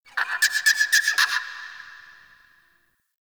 Forest Creature Far; Ambience; Warframe; Grineer

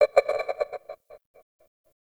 Vocalized Metal, Asteroid; Warframe; Grineer

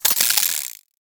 Scaldra Pistol Alt Mech React

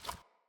Theme Error

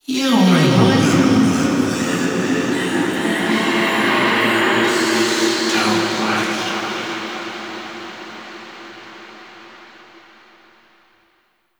cave echoes; Warframe; The War Within

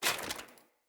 Foley Action Armour Walk; Player; Deora Armour

